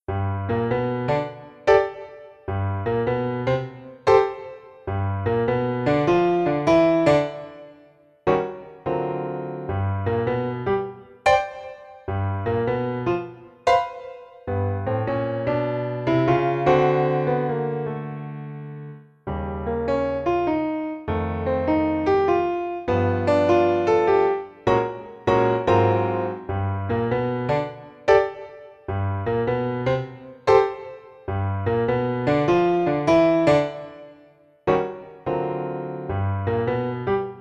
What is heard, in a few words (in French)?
Piano seul